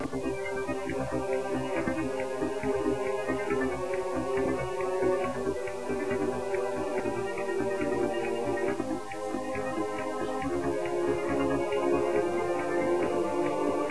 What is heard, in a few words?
bg music